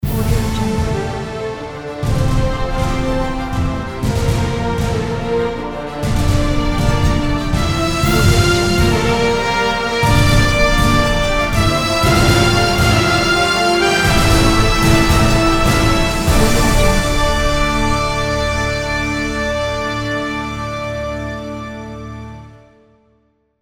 dramatic news documentary intro; anthonysigouin

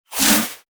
super fast alien swish

